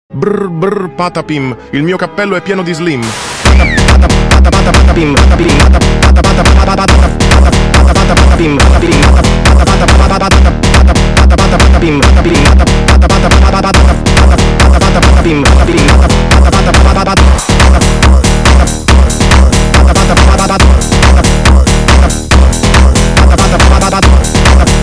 phonk groove; brr brr patapim

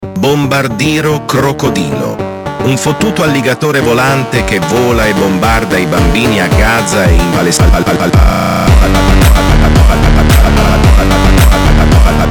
phonk groove; brazilian

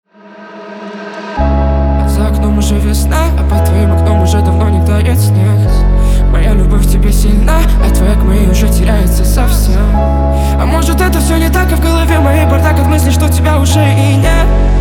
Phonk Version

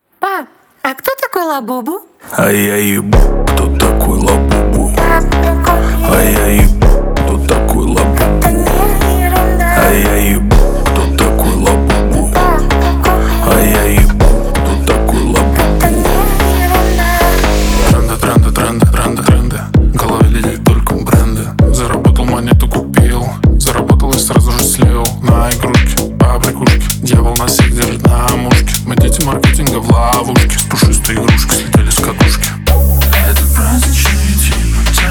Phonk Version

